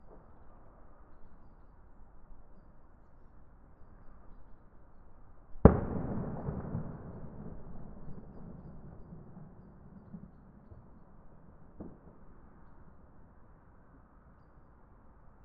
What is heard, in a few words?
Small Explosion In City Far Away